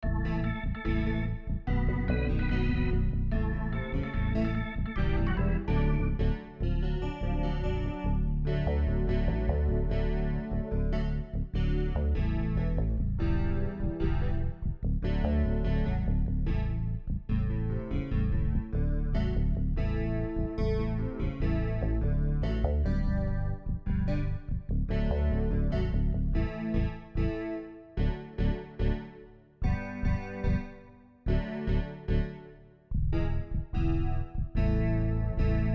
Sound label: God Made You backing; New Hope Music